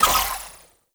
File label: Daikyu Bow Layer; Weapons; Warframe; Tenno; Daikyu Bow Prime Layer 3D A